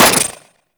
Miter Alt Mech, Weapons; Warframe; Tenno

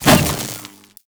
Prod Whoosh; Warframe; The New War; Drifter

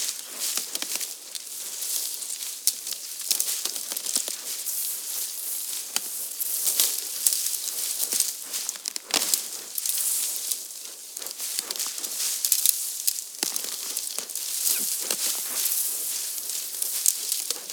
Plains Move Grass Loop; Eidolon Plains

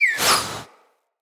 Bow Arrow Charged; Weapons; Player; Bows; DendritBow

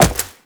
Wall Jump; Warframe; Tenno; Wall Run

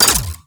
Flak Cannon Mech; Warframe; Railjack; RailJack Weapons

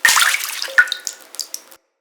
Hut Interior Drinking Well Drips; Ambience; Midrath Island; Grandfather

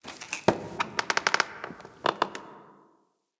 Station Misc, Ambience; Soul Plane; Orlick

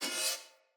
Split Sword Accent; Weapons; Player; Swords; Starter

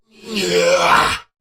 Attack Effort

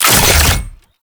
Fuel Projectile Pick Up; Warframe; Jade Shadows; Ascension

